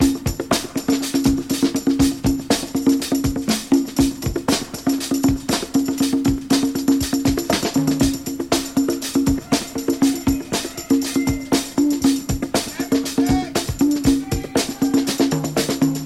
120 bpm fresh drum groove c sharp key, kick tuned to the C# note